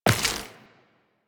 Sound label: Step Flesh, Footsteps Walk; Warframe; Tenno